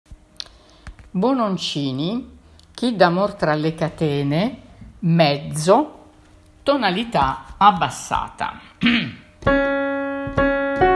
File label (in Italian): mezzo basse; Chi d'amor